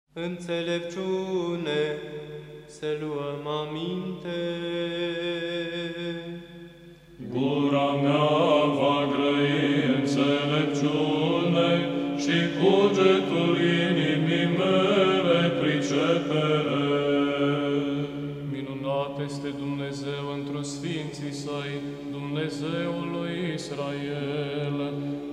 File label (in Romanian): Prochimenul; Paraclisul Sfantului Mucenic Ciprian